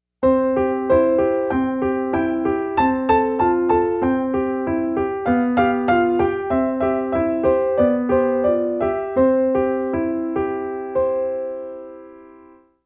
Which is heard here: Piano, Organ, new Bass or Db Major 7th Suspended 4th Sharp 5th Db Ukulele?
Piano